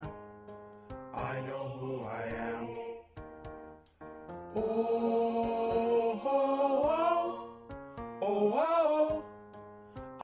Tenor, choir

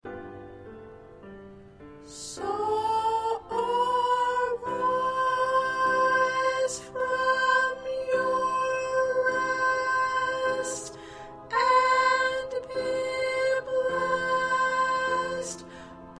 Soprano, choir parts

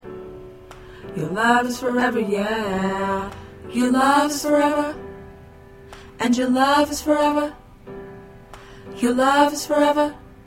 Alto, choir

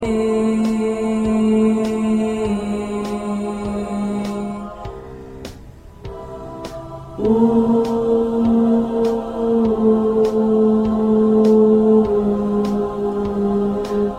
2nd Tenor, choir; christmas songs for choir; part preview

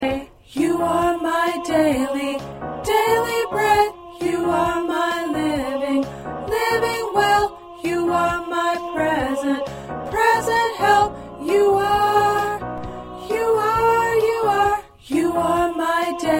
Alto, choir

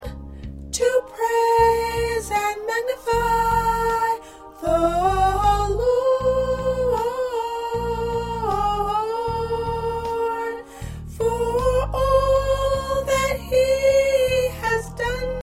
Soprano

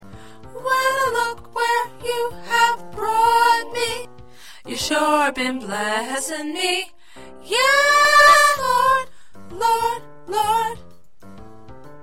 Soprano, choir parts

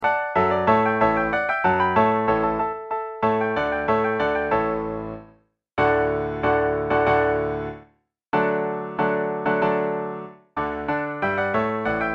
Piano

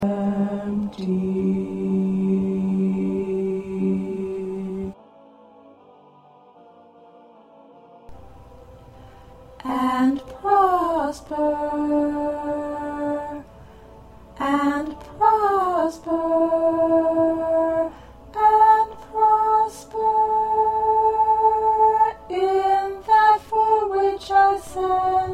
Alto 1

